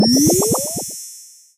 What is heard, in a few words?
Happy Up